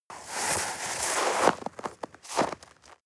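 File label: step snow walk limp single, footsteps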